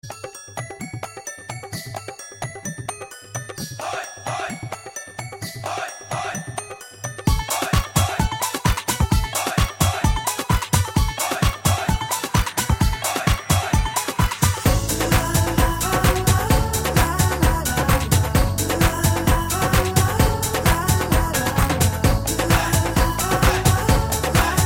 Club Mix, Ringtones; LG; X335

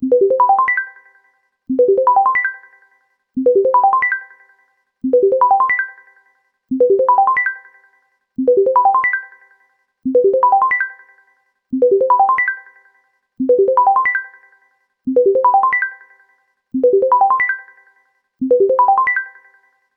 Bubble, ringtones; LG; Wine Smart F480K

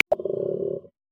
Koala, Australia; Xiaomi Redmi-Note-8-Pro

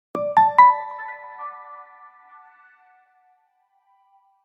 Calendar alert, Nokia alert tones; Asha 201

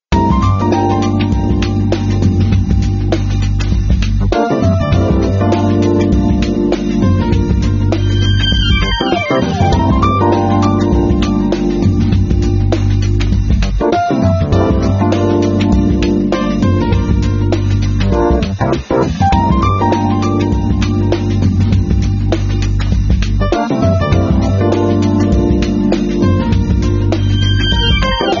Jazz, phonetones, Nokia 7710

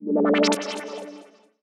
Submarine, notifications; LG Wing 5G Verizon

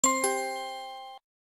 Chime

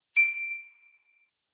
Power Down; Motorola WX160

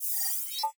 technology up; OnePlus 12

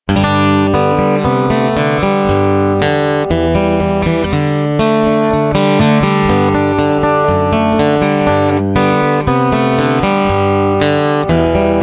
ring acoustic, phone; HP iPAQ Glisten

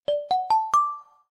Strike, notifications; ZTE Axon-20-5G

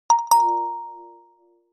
MESSAGE; Nokia; Henry Daw; HMD Global

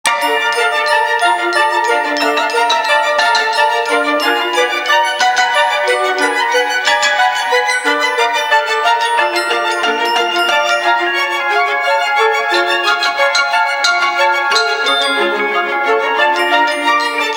Ring Veloce; Vertu Constellation Quest

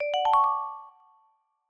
Charging Started Fast Calm; Samsung; Galaxy A52s

